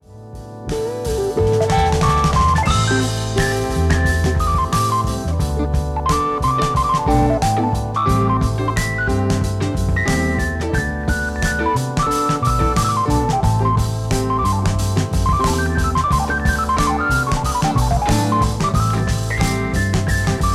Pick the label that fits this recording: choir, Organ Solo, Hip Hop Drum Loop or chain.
Organ Solo